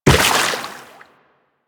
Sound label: Run Water, Footsteps; DE; Warframe; Tenno